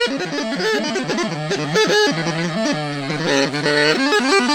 Prac. Bagp.; Sonic Foundry ACID Music

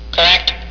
correct; The Best of Select: Multimedia 15

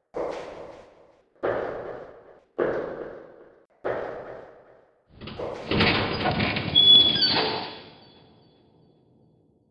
DOOR OPEN

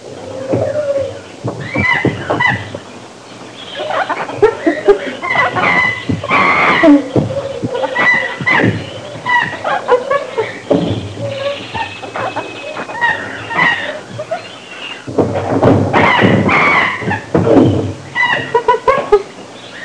monkeys in jungle; jungle animals

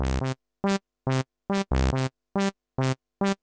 bass; Pong; music; ice

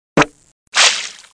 SQUIRT

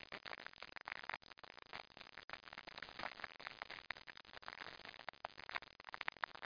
fire

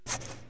chalk, 9ball

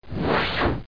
RUBBER BAND; PC Gamer IT CD 31; mjongg; MOREJONG

